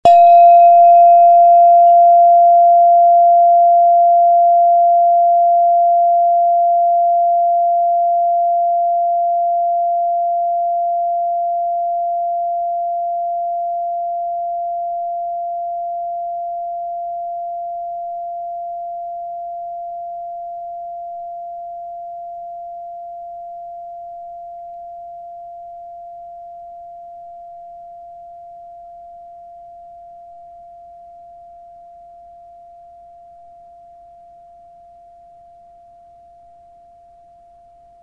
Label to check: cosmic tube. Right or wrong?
Right